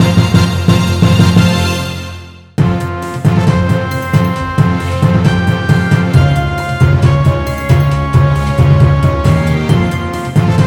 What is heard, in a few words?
Mission Success; Damascus Gear Operation Tokyo